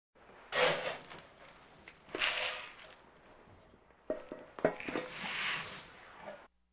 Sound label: Animal effects snorts grunts farm ambience